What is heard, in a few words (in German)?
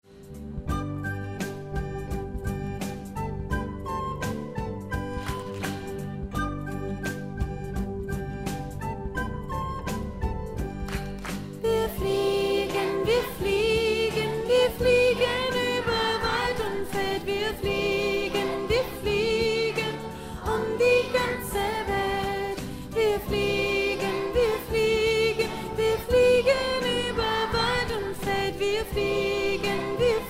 Chorstimme Hexenflug hoch; Mittlere Stimme; Die Musik-Insel